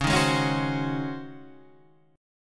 C sharp Major 7th Suspended 2nd Suspended 4th Guitar Half Step Down; C#M7sus2sus4